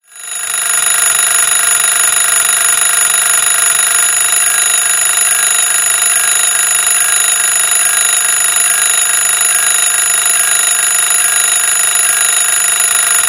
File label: Classic Clock Alert